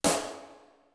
BRIGHT SNR, DRUMS; Greytsounds VOL-1; T1 DRUMS

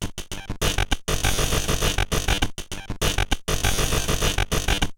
Bent RX17 (100BPM), Circuit Bent; glitch

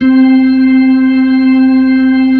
B-3

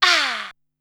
"Haaa", Korg Wavestation-SR Drum Kit

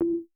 Perc Reso, Vermona Mono15 Drums

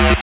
SYN TONE; Sound & Vision - Gigapack II

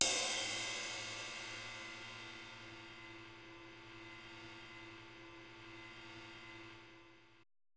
cymbal, Roland MC-909

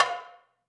DARBUKA; AKAI S-Series CD-ROM Sound Library VOL-5; AFRO.DARBUKA

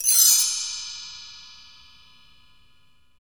PRC BELL

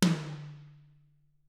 Toms; Bob Clearmountain Drums I

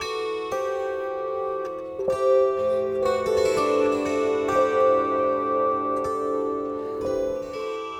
Kantele In; Sonic Foundry (Sony Creative Software); Friendly Fire

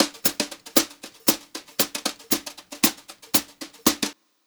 Brushed Drum Loops, JAZZY, 116

